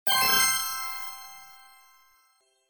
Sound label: roulette up